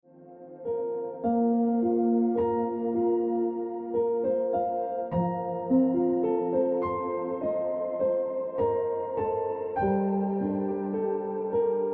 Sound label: Piano Music